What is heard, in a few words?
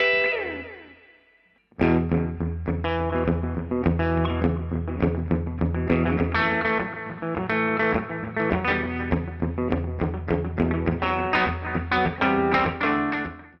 delay